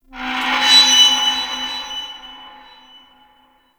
High Metal Screech; Warframe; Tau; Ambience

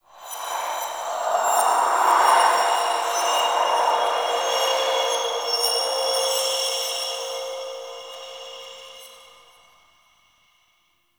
Interior Cave Spirit Gust; Ambience; Warframe; Duviri

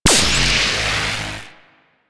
Corpus Powerplant Tesla Coil Hit; Ambience; Warframe; Corpus Railjack; Tether Power Plant

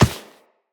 Walk Default, Footsteps; Operator

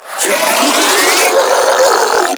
Spin Scream; Enemies; Abilities; Warframe; Proto Infested Ancient

